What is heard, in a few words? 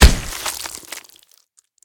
hit